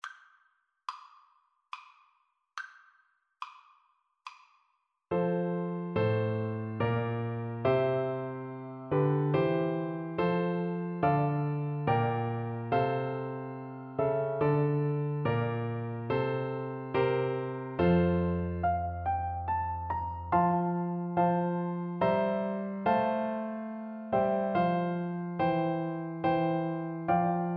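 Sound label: recorder karaoke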